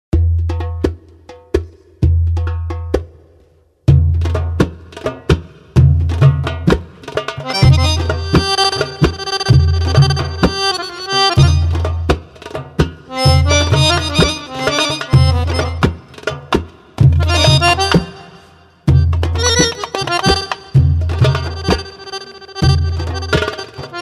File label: Darbouka With Accordion